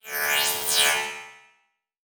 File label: Title Transition Electrical Power Down; Adobe; Production Element